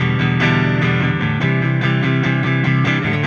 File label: Silos Clean Strum Guitar, Indie Rock